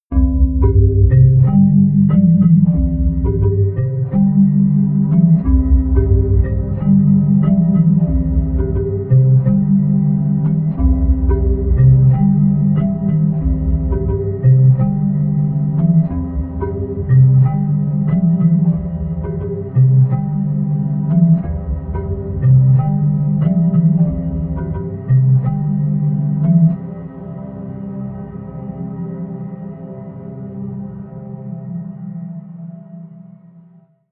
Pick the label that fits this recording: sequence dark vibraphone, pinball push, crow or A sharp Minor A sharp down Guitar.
sequence dark vibraphone